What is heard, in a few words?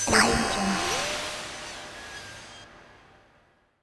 dark scifi transition